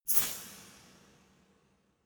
resonator hit double hard

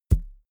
balloon hit head low

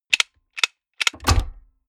mechanics medium rattle

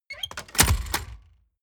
mechanics small movement

